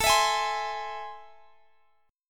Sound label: A Minor Major 7th A, Guitar, Half Step Down